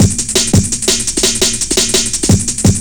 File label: Break, Jungle; Marbles; Breaks